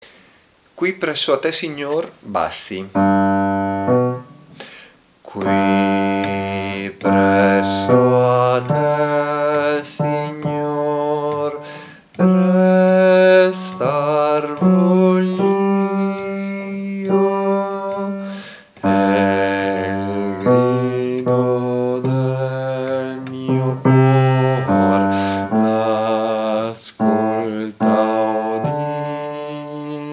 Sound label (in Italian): bassi; Qui presso a te